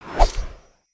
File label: Summary Whoosh; aronwk collection; ndaudio